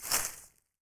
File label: Footstep Grass Light; Footsteps